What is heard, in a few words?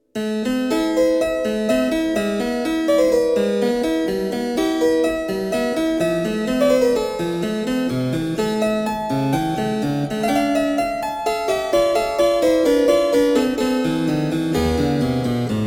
sonata6 a moll; J.W.Hassler